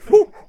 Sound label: Bard Attack Vocals